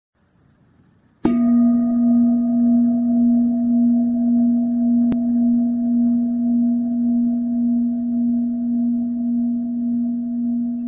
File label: pause min bird song; Поза, улыбка, слушание, дыхание. Пение птиц. 17 мин.